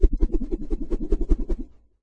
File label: kung fu staff, swings